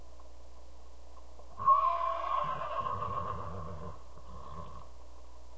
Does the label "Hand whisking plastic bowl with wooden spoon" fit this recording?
No